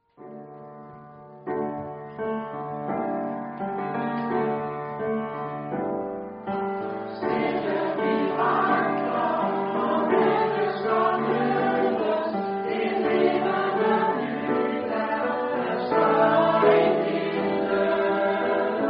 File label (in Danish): sopran tenor